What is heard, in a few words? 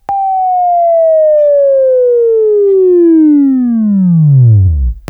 Buzz, Left; Labview-Program; Sweeping Training program